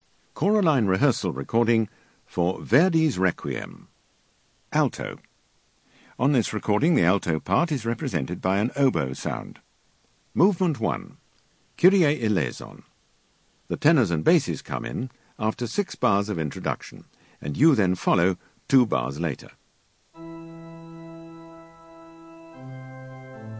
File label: Alto; Verdi Requiem